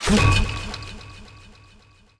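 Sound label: ricochet hit modules, tanks; killscene; War Thunder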